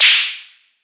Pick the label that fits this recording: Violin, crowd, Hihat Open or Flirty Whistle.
Hihat Open